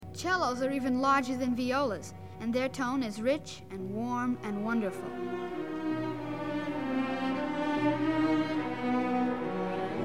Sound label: Variation G; (Cellos); orchestra; Young People's Orchestra